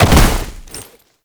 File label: Land Heavy Snow, movement; Warframe; Uriel